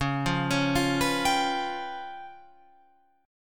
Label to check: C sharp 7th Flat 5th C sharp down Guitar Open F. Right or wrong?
Right